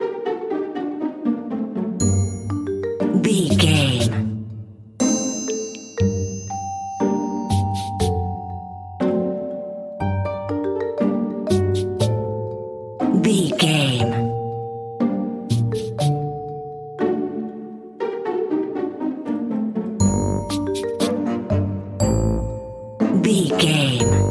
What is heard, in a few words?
sneaky tiptoeing